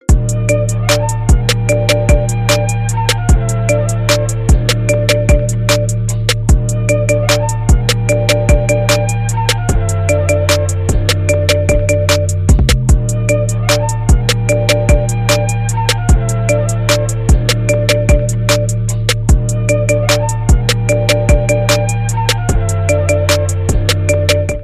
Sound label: marimba, ringetone